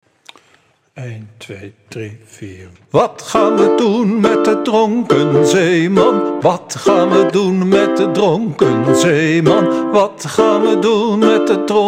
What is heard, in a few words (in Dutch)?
Dronken zeeman Dm reggae